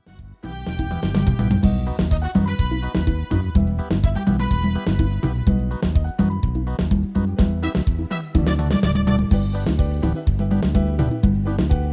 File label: MIDI